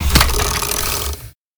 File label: poison